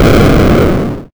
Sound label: epic hit; project hawk